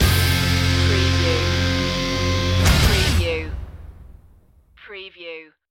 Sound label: metal master stinger